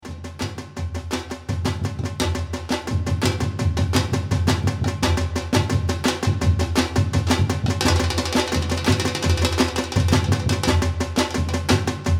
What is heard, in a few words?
Samba Reggie; brasilian dance practice